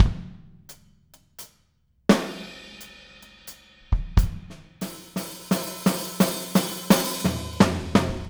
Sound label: Fill, Full Mix, Drums; Sonic Foundry (Sony Creative Software); Drums from the Big Room The Mixes; Demo Projects